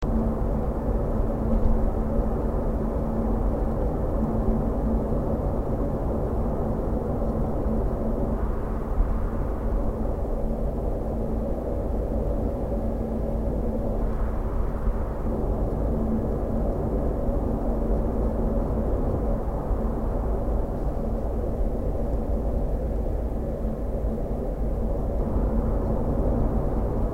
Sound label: car run